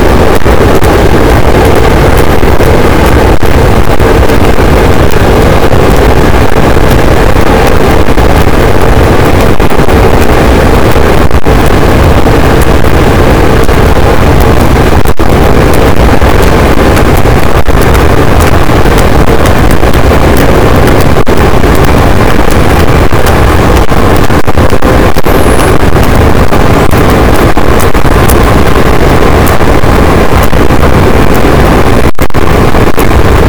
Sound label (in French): cachalot positif, boat, Physeter Macrocephalus